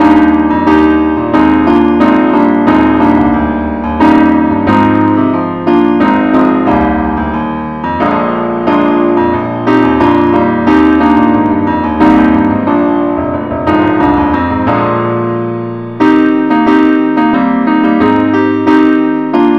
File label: ineedthee keyboard only key ees